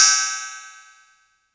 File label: Cymbal; TRX